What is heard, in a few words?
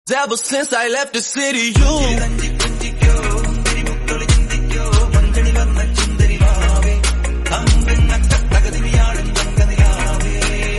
Mashup Remix